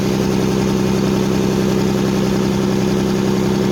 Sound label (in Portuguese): motor volvo